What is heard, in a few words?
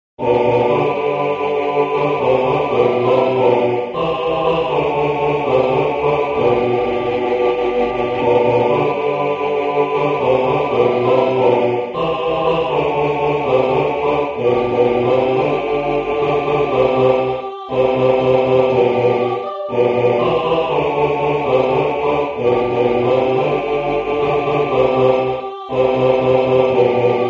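siyahamba tenor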